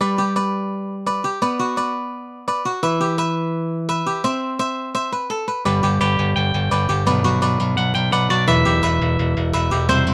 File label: Guitar; The Office